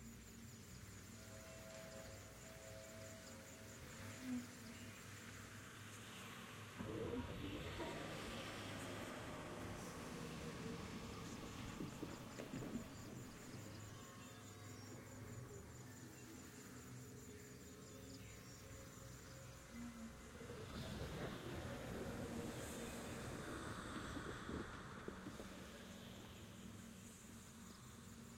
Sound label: amb ixtal ruins